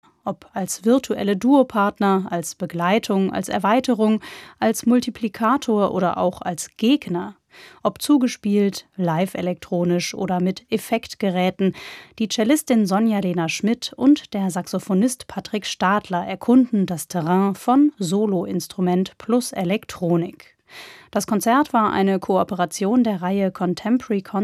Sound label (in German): Vokalmusik